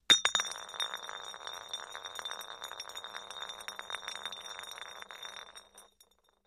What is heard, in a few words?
Glass Drops Rolls; bottles